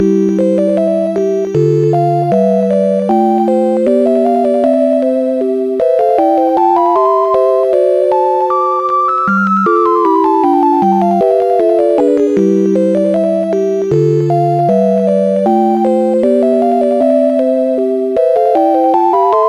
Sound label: jingle; Traps n Treasures